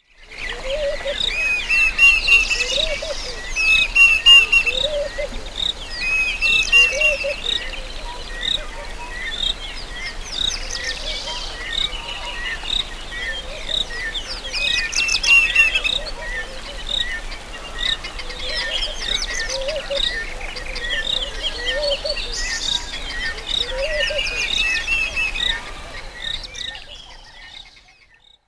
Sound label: sounds of the african bush